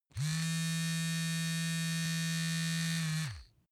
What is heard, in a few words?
razor smooth